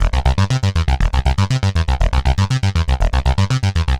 Arpeggio Bass, Prototechno

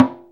LONG DRUM, ETHNIC DRUMS; Zero-G Ambient 1

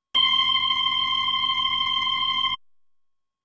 B3 Organ; Masterbits CLIMAX 8

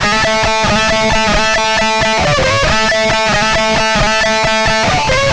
Guitar Licks

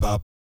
BAP G#2, Vocal Jazz FX; Spectrasonics; Vocal Planet; JAZZCOMBO

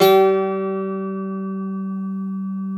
CIST G 3, cister; SoundBytes; Early Patches

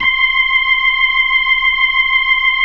C6F, B3

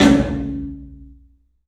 CLUB SN VRB, 3D Drums; 3D Sound

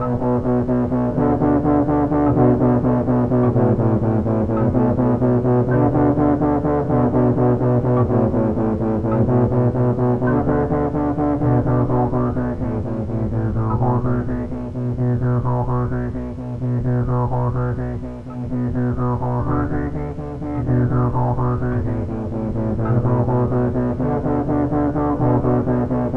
Keys Texture, Indie Rock Anthems; Sonic Foundry (Sony Creative Software)

